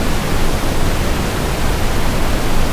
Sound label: stream